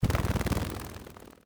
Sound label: GRASSHOPPER, Insect, whirring, heavy, flying away, fast; Wings; Bonson